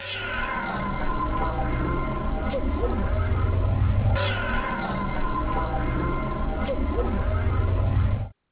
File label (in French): autoradio